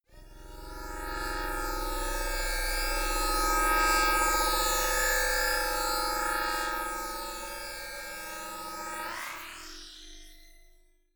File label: whoosh drone swell detuned long